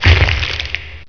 SPLAT; The Ultimate Guide to Mortal Kombat

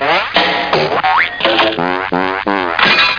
SPLAT; zebedee

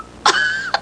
COUGH; Internet Pratique 01

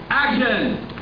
action; batman

